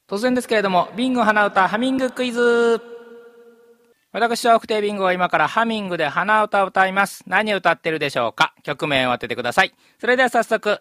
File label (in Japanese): hanauta